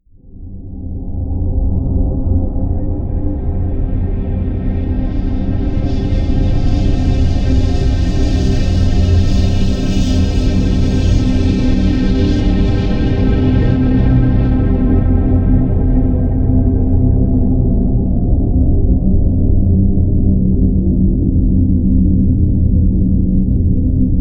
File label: drone moving air low